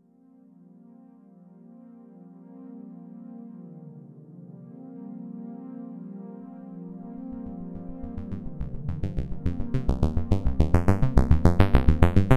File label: Notch Filter Xpander Strings